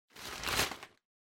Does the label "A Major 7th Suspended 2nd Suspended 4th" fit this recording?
No